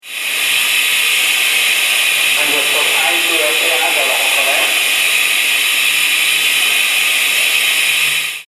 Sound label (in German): Feuerwehuebung Atmenluft